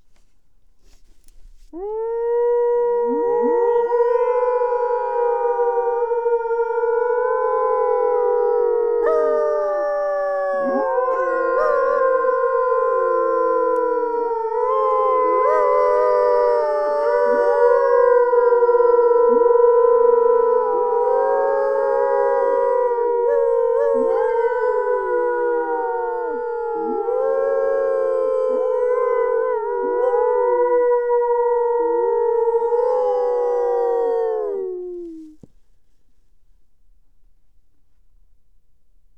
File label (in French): 4 hurleurs, loup; cris divers; Florac